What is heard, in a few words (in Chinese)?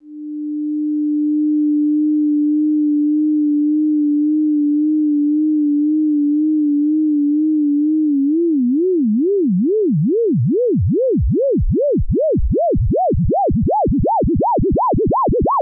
合成之信號, Cmusic; software package for computer music synthesis